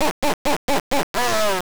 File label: Hahahahahaha; Altered Beast; Master System